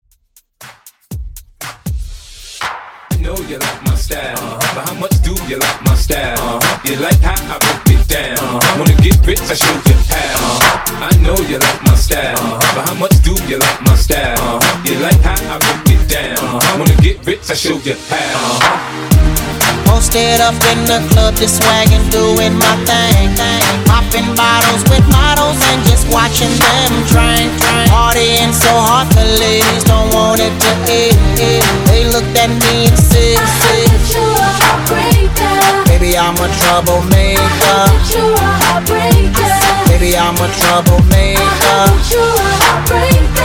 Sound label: Party Break Uptempo, 120 BPM